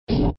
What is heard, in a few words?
overlay close; games; poker; American Poker The Legends II